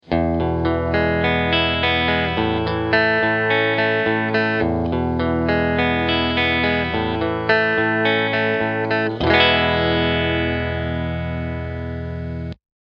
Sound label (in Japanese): me-70 ナチュラルトーン